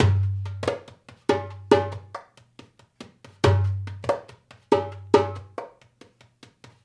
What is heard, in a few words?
Dumbek; Essential Percussion